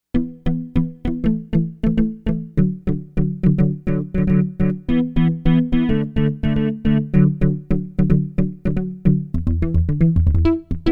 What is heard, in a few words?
MuteGuitar, Novation UltraNova; Factory Patches